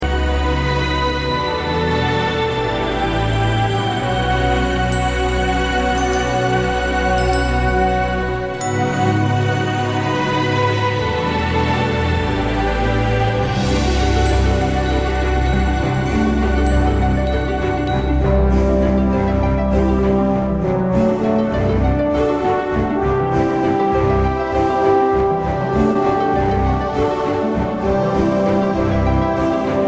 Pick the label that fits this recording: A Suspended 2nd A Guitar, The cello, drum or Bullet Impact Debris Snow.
The cello